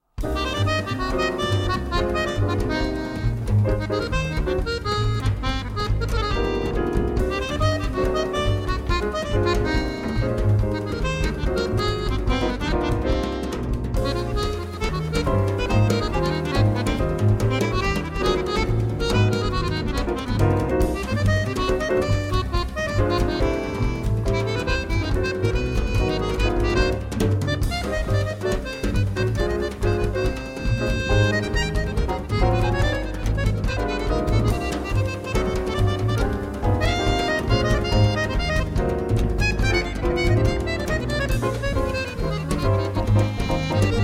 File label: samba